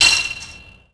grind chainlink on; terrains; Tony Hawk's Project 8; XBOX

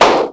Burst; Luxo; XBOX; Finding Nemo

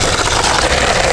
slide conc; terrains; Tony Hawk's Project 8; XBOX

